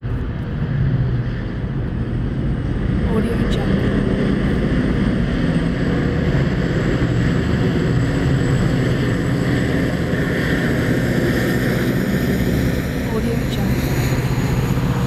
train by pass by

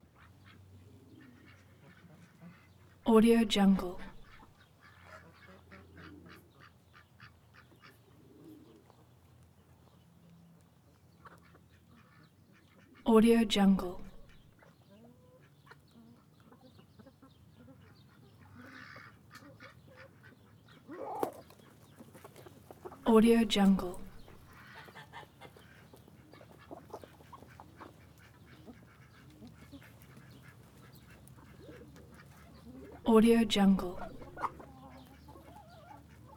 chicken coop ducks geese pigeons chirps

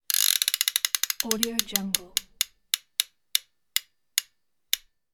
prize wheel; soundjay

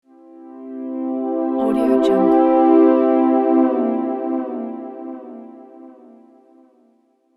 smooth transition

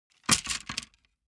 object wood sticks small break; smartsoundfx

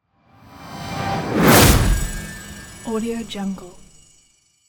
arcane shot light energy; smartsoundfx

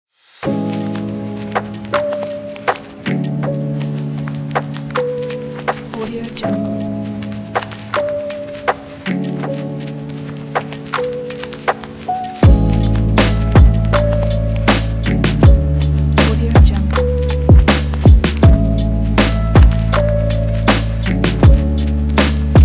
lofi chill beat

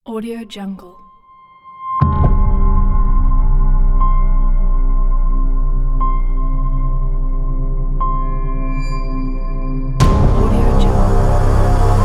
creepy horror trailer; oxulus

